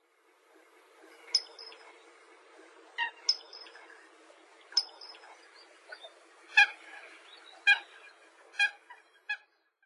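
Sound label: Eurasian coot, awara; オオバン; 福井県あわら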